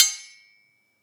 Sword Clash

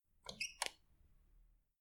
Front Door Opening

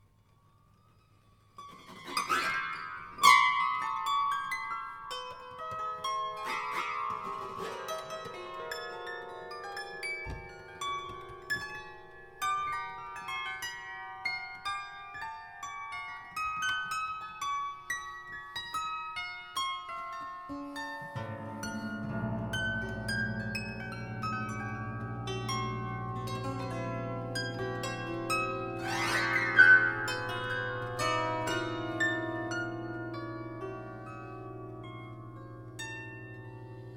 Piano Harp

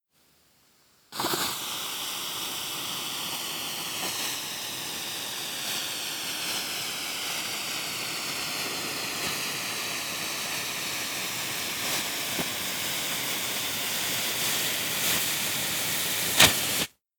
Fuse

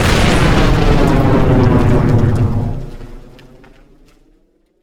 Huge Explosion

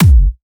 Kick Drum Hard

